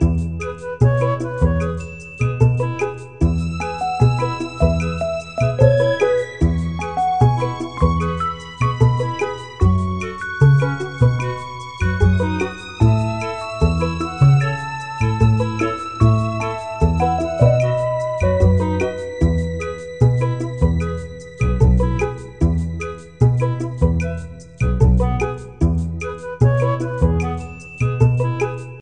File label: Reward Music